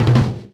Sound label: Drum Tom Med; Levels Hub Mansion; Twitch Drums